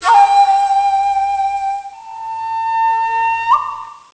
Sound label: Shakuhachi; kentei kyoukai imasara hito ni wa kikenai otona no joushiki ryoku training ds